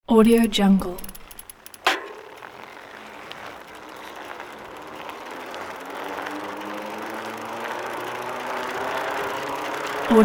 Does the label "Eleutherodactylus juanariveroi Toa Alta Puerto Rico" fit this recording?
No